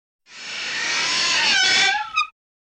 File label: metal, gnissel